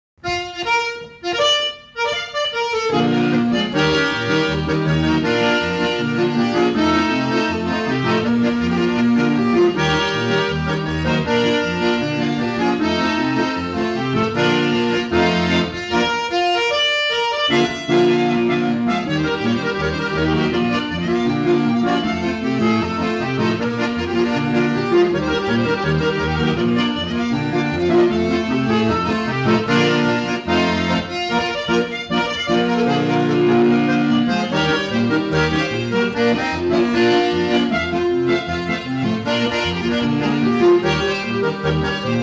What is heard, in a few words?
Fast Polka; Sauk Prairie